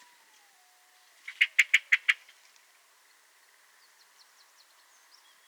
merle noir; cri d'alarme du Merle noir

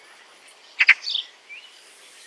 pie bavarde

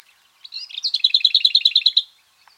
fauvette babillarde; Trille de type Itération rapide; chant; Syllabe "tu" Itération " ( )* " sur "tu"

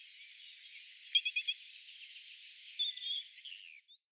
venturon corse

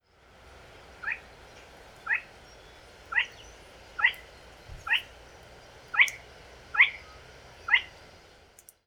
marouette ponctuee; Chant perçant tranché : {E1} uit; Martin-pêcheur d'Europe; Cri : quasi-identique au chant

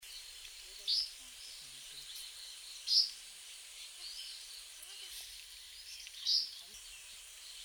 gobemouche gris

